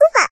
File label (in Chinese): 生气的胡巴